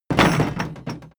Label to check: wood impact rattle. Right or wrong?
Right